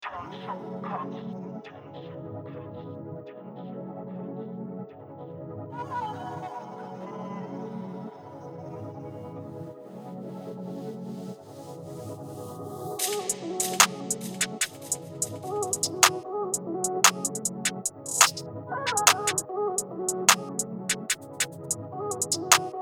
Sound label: 148BPM F min